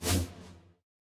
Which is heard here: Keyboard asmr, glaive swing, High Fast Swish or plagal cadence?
High Fast Swish